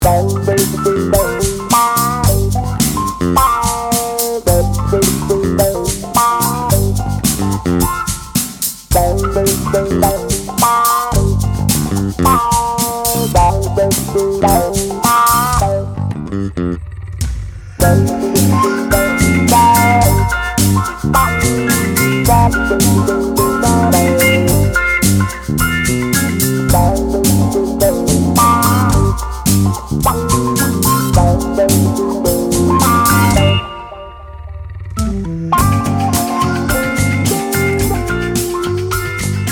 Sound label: Drums